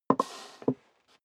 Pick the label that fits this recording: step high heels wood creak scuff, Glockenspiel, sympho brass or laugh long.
step high heels wood creak scuff